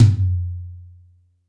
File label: A2 - Rock Tom, Yamaha PSR-E323 Rock Kit